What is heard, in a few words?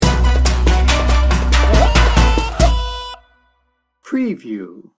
brain noize sting bumper